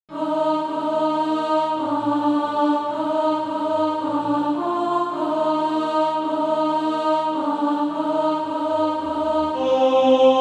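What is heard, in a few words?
alto, SATB Practice Music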